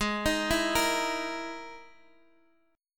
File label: E Major 7th Flat 5th Ab